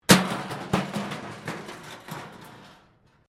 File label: metal rubbish bin smash concrete